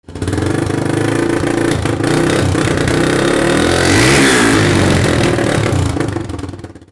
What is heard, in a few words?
VEHICLES